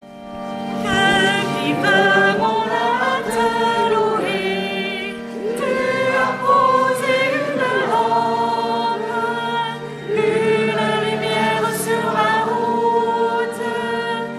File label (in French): chant entree